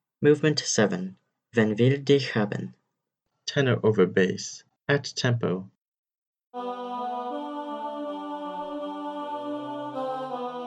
tenor over bass at tempo, choir

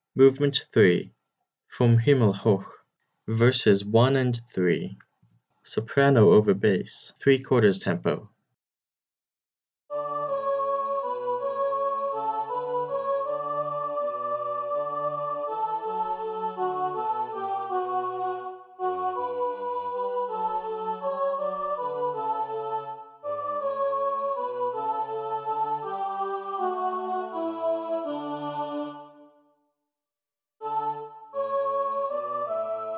soprano over bass three quarters tempo, choir

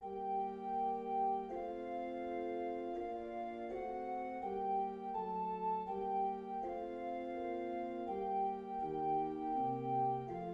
alto add tenor bass, choir; bach 10 lob und preis; lob und preis sei gott dem vater und dem sohn bwv 10

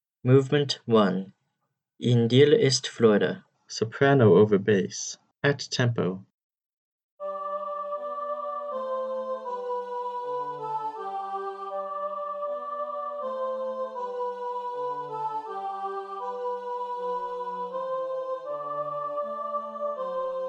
soprano over bass at tempo; edwards m a in dir ist freude movement 1 in dir ist freude

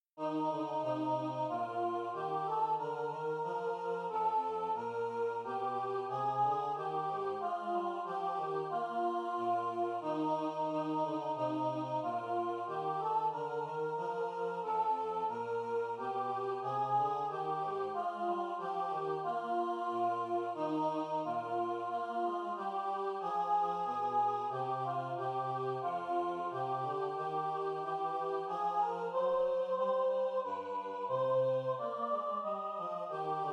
soprano over bass, choir; hymnal 1982; 91 break forth o beauteous heavenly light

